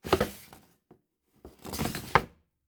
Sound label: drawer washing machine